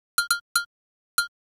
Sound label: Agogo Hi; hydrogen